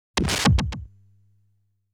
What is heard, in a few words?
Ground Enemy Out